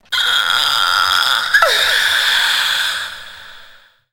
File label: female orgasm